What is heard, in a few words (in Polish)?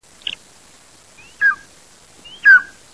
Orzełek południowy - Hieraaetus fasciatus, głosy